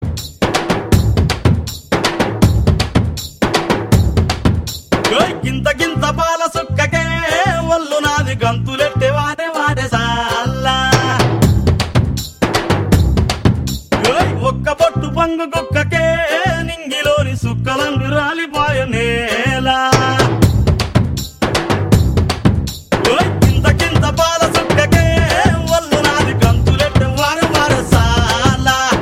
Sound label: Telugu Folk Tone